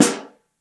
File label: SN3 Mono, snare